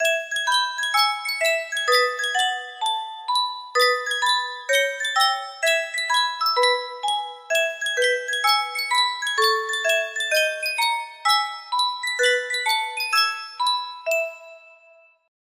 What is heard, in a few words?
Sankyo music box, music box melody